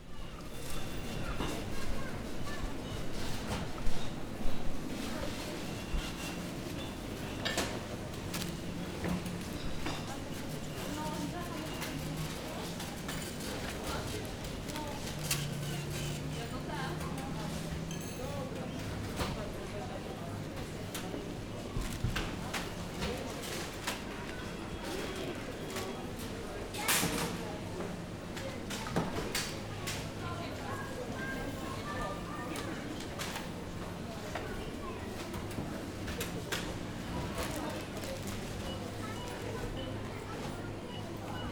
Supermarket Ambience; Undertaker's 2017 gamerip PC

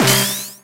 Electro block

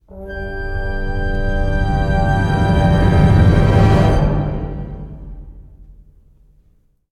Stingers

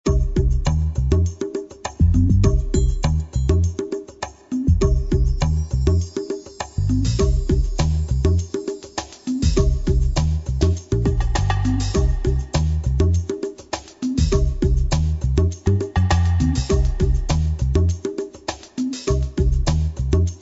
Jazz; Largo Winch Empire Under Threat; GC; PS2; Xbox

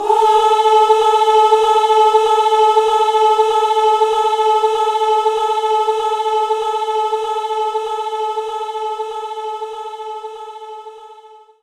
Concert Choir A4; knights try

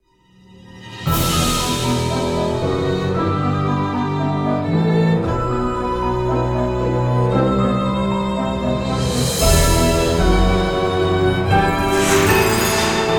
Splash; Nancy Drew: Warnings at Waverly Academy

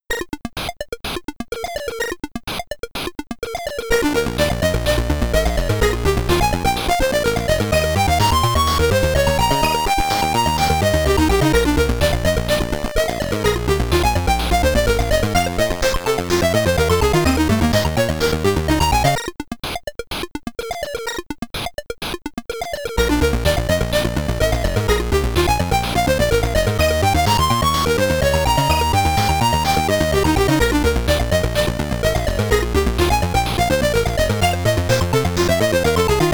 Ending (SID Stereo), Commodore 64; double dragon 3

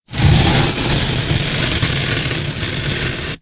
ice slide

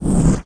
Plague; lego ninjago rise of the snakes

